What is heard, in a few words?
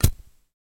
Zippo Lighter Flint; lighters & matches